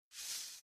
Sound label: character whoosh; toystory